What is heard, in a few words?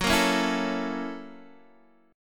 Gb 7th Sharp 9th, Guitar, Open D; chordshape; down